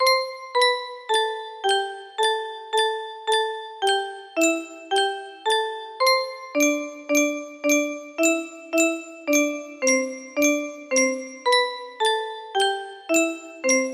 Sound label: music box